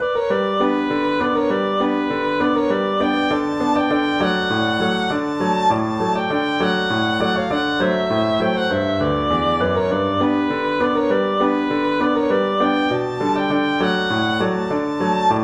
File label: violin